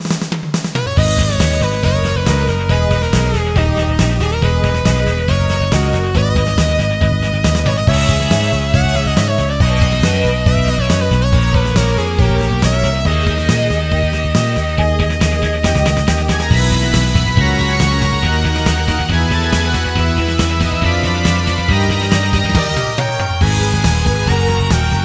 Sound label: Stage; Dr. Cossack Stage 1